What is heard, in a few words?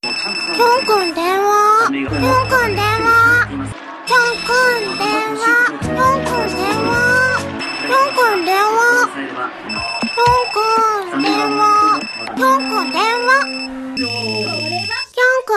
RINGTONE